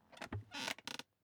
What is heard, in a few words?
object chair office creak lean back short